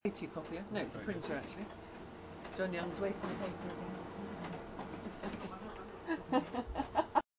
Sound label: sounds of printer